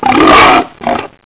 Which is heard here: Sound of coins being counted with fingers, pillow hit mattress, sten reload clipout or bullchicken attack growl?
bullchicken attack growl